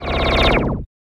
Boss Wrong

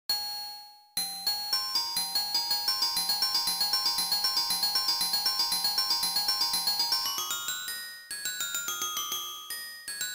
Puppy Waltz Box; Nintendogs; Nintendo DS

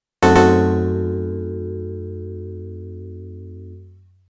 Horrifying Shock; FM-TOWNS

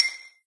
Combo Sound; candy crush saga gamerip

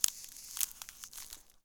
ice, Ice Cliffs

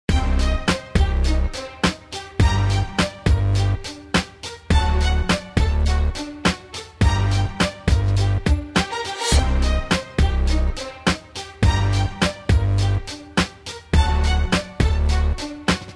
Electric Ballet; Puffle Party; club penguin fun event trilogy

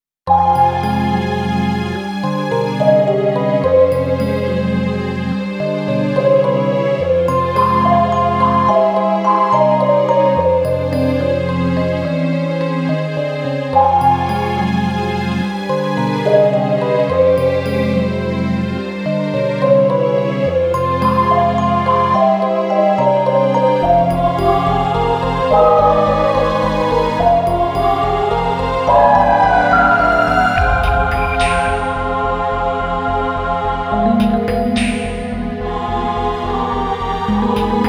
The Water Drum; Witch's Tale; The Apprentice Witch and the Seven Princesses

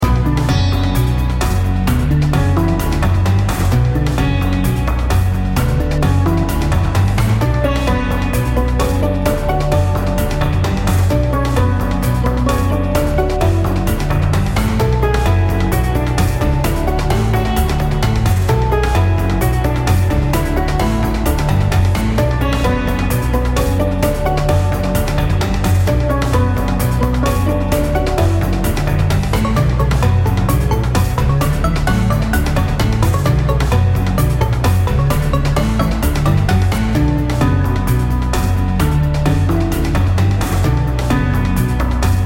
chibi cave creepy cavern; Cherrybelle Magical Diaries; Android

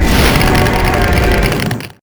Frost Roar; Arelite Core

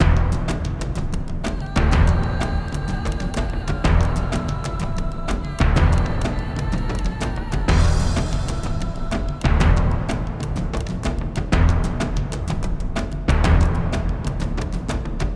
sounds music; Halo Combat Evolved; Xbox

